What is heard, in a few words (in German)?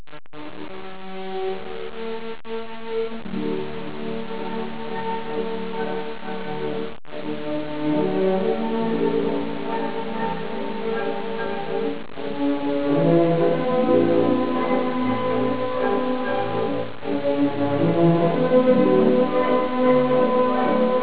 walzer